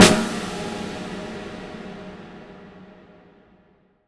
Snare Hit, Impact Drum Hits

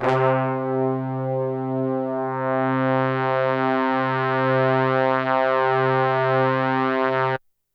HORNS C2 L

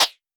Tempest Analog Snare

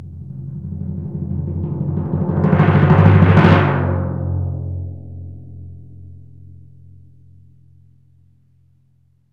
PRC TIMP RO, Timp Cresc; Roland LCDP03

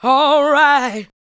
ADLIBS

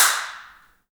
handclaps, Heart of Africa

